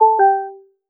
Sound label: disengage; IQ.Pilot; selfdrive